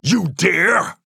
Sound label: Taking Damage; Paladins; Atlas